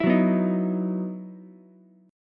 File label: E Major 7th Suspended 2nd E down Ukulele; EM7sus2 strummed